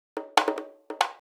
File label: Bongos; PsyTrance Project; Ableton